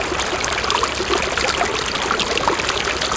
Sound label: river loop, water; Tony Hawk's Project 8; XBOX